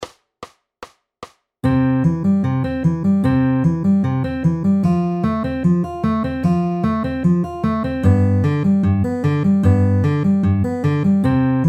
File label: C F G C pinch middle middle at 150 bpm